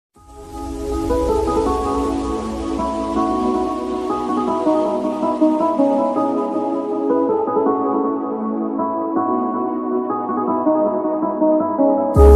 new turkish ringtone new arabic ringtone islamic ringtone